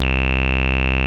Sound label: Moog hi C1, analog bass; Music Store - Claudius Diemeter Schwerlos